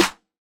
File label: trap engine snare